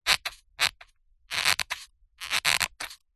foley plastic squeeze stress creak against dense foam; zapsplat